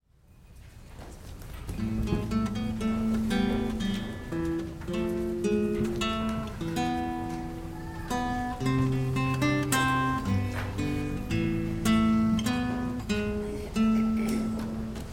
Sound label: Guitar Solo